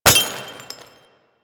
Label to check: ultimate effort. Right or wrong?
Wrong